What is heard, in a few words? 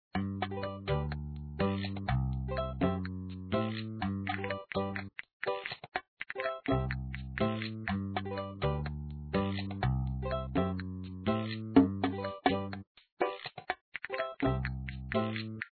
topping screen music; papa s freezeria gamerip